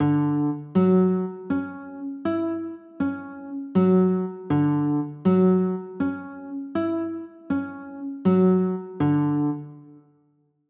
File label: d maj, chords; d maj9